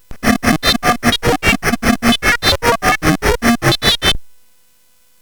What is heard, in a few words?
Synth Sample, CSG8580R5; hvsc